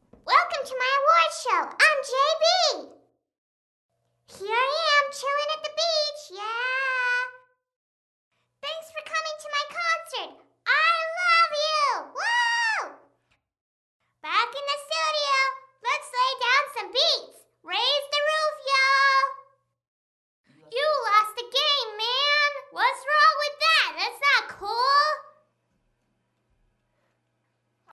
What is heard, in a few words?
vocals all